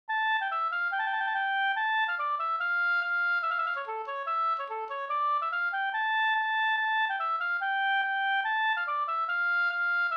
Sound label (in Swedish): reinlender